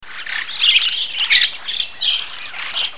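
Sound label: white-cheeked starling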